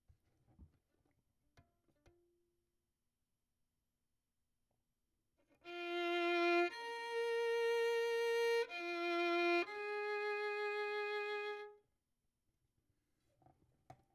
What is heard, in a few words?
Violin; Verdigris Music